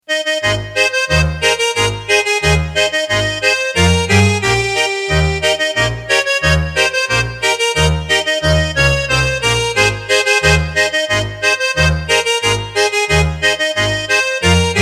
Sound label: Schuetzen Marsch